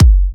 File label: F#, Kicks; markus hakala